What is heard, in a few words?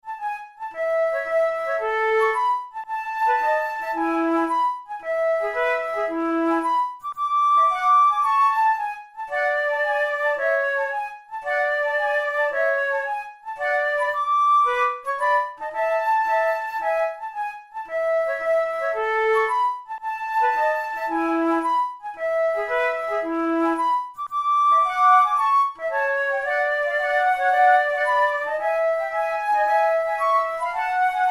methode de flute; tulou